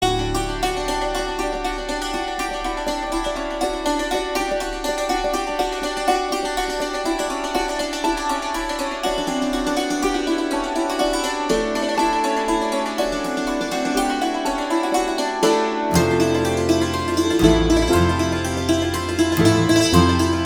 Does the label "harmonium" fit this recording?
Yes